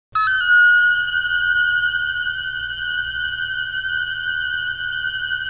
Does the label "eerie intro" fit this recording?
Yes